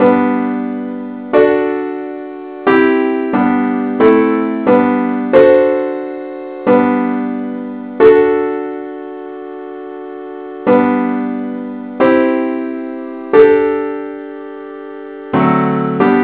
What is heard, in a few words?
simple Acc